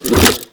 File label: Rifle Reload; DE; Warframe; Weapons; Sentient; Sent Rifle New War